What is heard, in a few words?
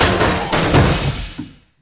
door close; gmod; lvs star wars; laat